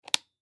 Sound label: Black Light Switch; Black Light Switch Turn On; Switches; Foley